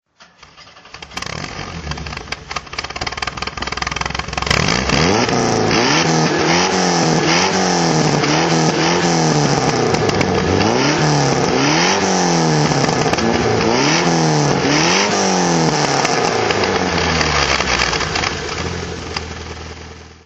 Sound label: bmw 2002 ti tuned throttle, BMW 2002 TI rally car, Engine sound; BMW 2002 Tii rally car, 1973; V3D/ ClassicarImages